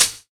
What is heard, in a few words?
HIHAT; E-Lab; X Static Goldmine 2; akai